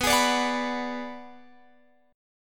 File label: B Minor 6th B, Guitar Open F; Bm6 strummed